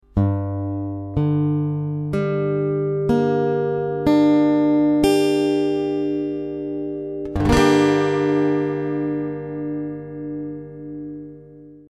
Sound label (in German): g moll